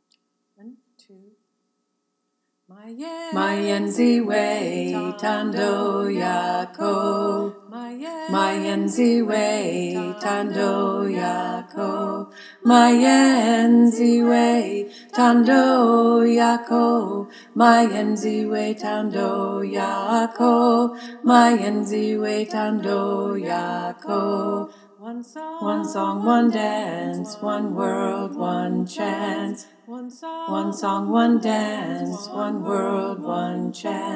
tenor, choir; freedom choir

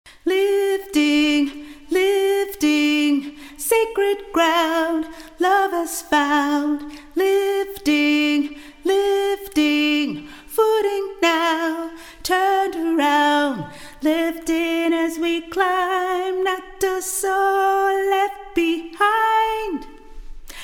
SOP, choir; freedom choir

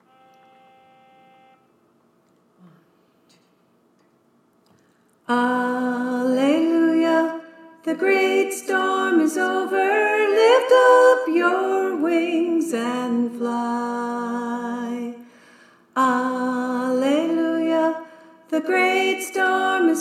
soprano; The Freedom Choir

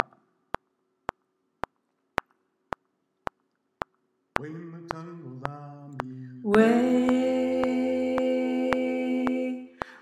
Tenor; The Freedom Choir

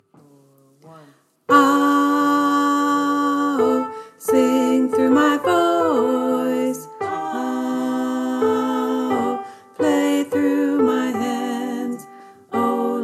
Tenor; The Freedom Choir

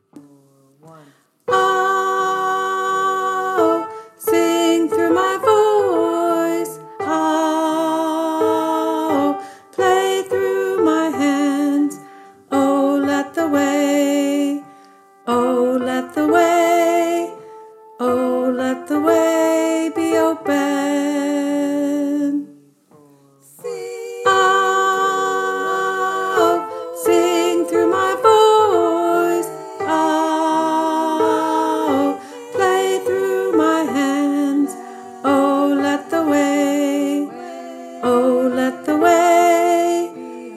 alto; The Freedom Choir

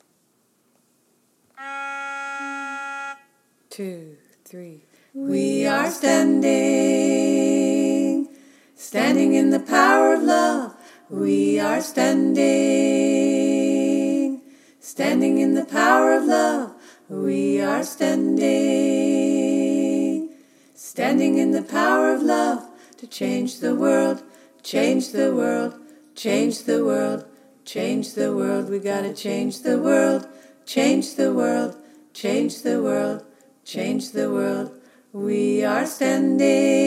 All parts, choir; The Freedom Choir

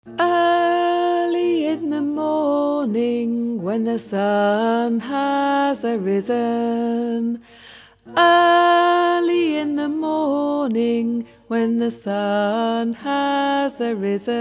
alto; The Freedom Choir

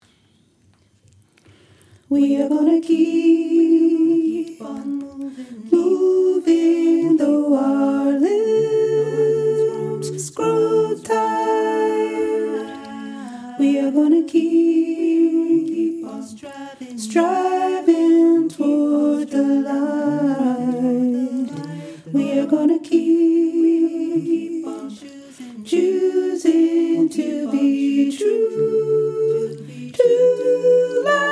Soprano; The Freedom Choir

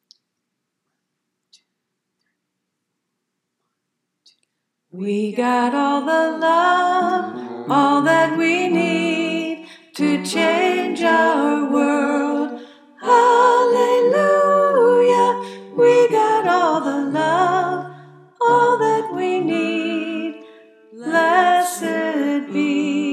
We Got All The Love Sop

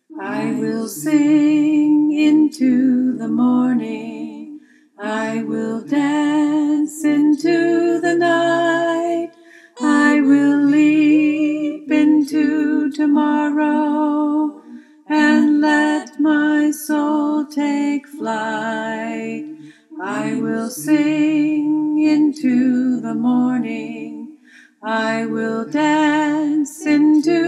Alto

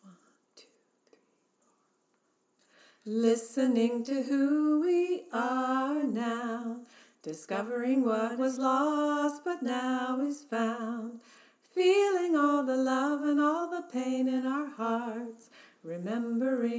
soprano, choir; The Freedom Choir

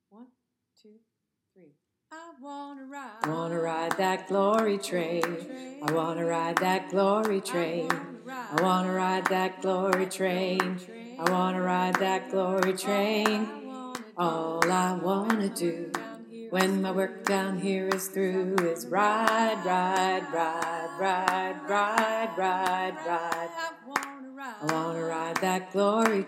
tenor

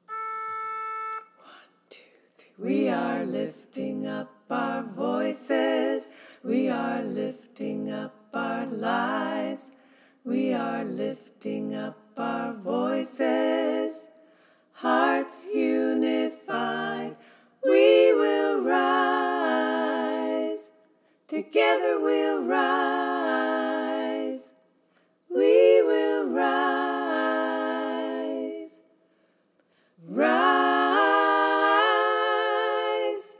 All parts, choir; The Freedom Choir

